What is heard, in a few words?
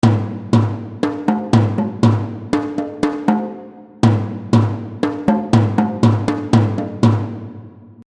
War Drums Loop; Musical Sound Effects